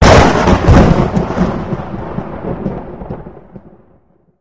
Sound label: thunder